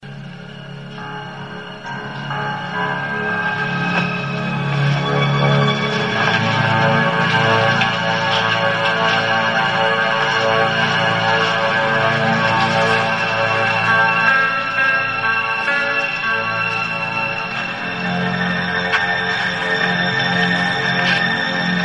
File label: suspense theme saxophone